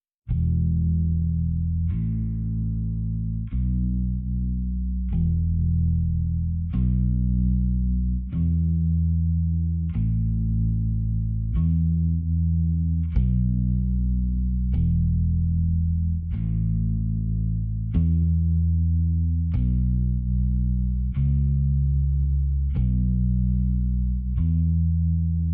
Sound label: Bass